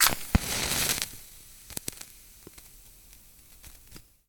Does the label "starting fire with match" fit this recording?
Yes